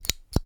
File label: Staple Remover